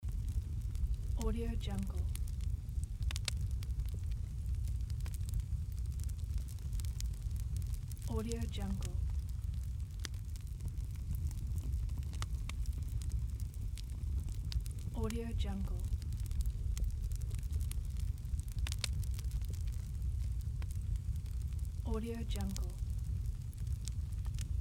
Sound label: light fire